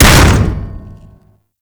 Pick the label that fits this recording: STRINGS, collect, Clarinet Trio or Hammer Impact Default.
Hammer Impact Default